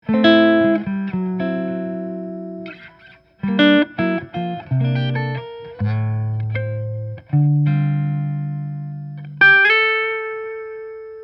amp, guitars, archtop guitars, acoustic; roger borys special; flamed maple european spruce

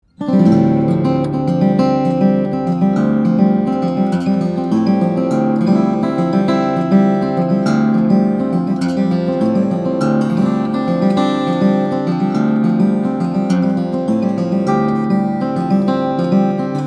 OM, guitars, steel-string guitars, flattop; michaud made; om-r; brazilian rosewood; european spruce

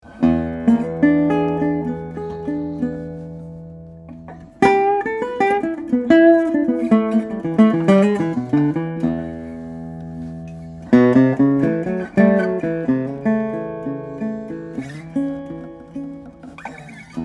Wood Body Resonator, guitars; mahogany; spruce; electric guitars; hollow body; Pogreba

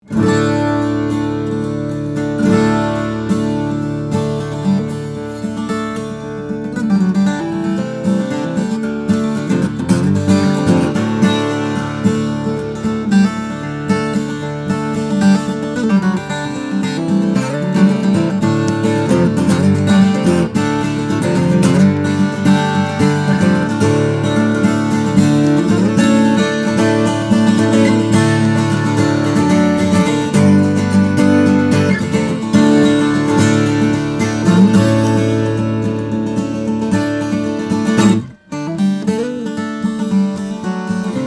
taylor k65e, steel string guitars flattop

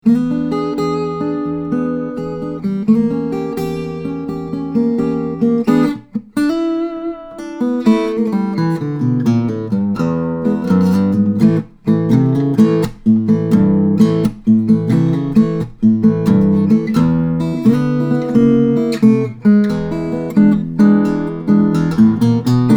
cabaret steel, steel string guitars, flattop; buscarino

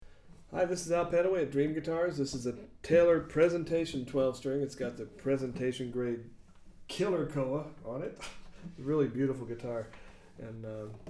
taylor, steel string guitars, flattop; ps 55 12 string

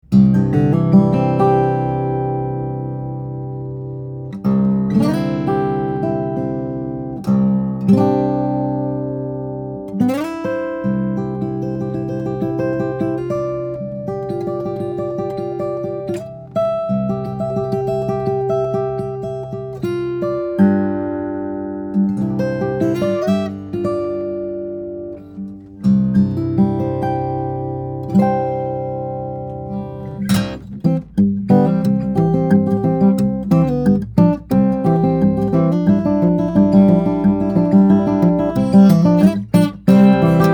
Martin OM 45, steel string guitars flattop; custom shop; madagascar rosewood spruce

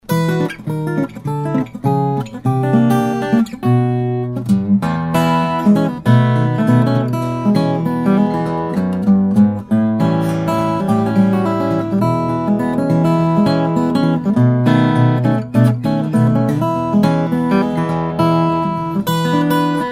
Lakewood A45 Custom

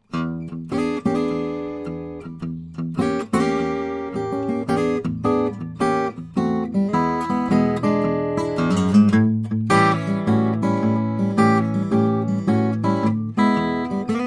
galloup borealis, steel-string flattop guitar

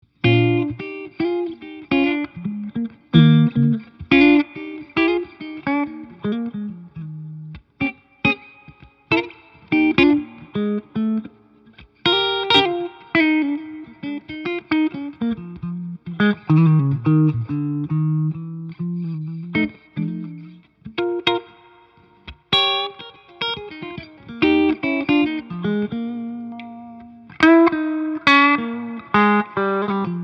Collings I-35, guitars; 2007; dlx; maple mahogany; electric guitars; semi-hollow

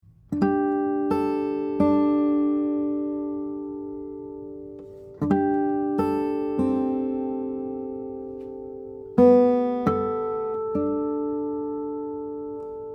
Martin OM 28, steel-string guitars; 1930; brazilian rosewood adirondack spruce

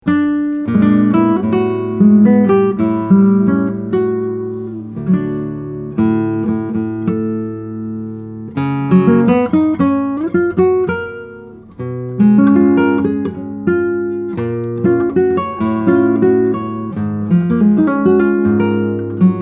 concert; steven walter guitars; nylon-string guitars; classical guitars

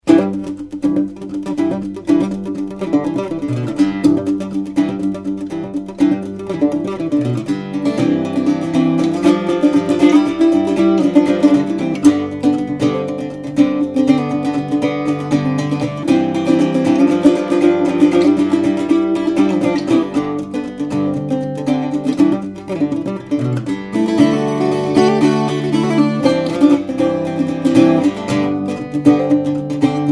octave mandolin; sobell

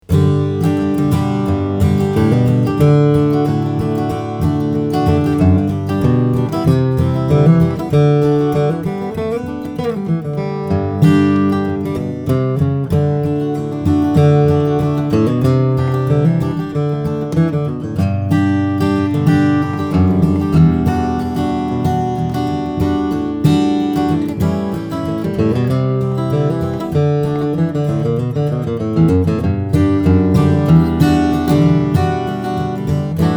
dunwell the tree, steel string guitars, flattop; slope d 12 fret

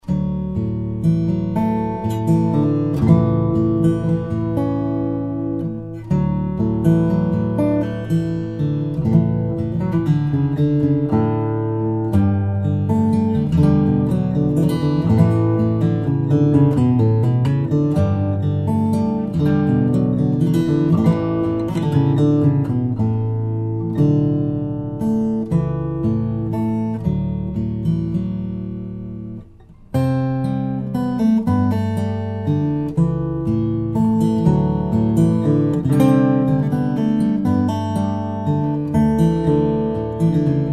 baritone, steel string guitars flattop; model f baritone grand auditorium; wingert

